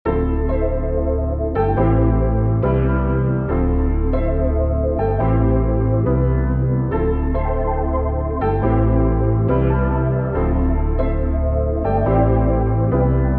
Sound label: Octane MIDI A Min; Cymatics